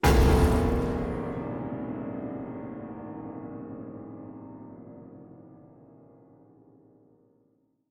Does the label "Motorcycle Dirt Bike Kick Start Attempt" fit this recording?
No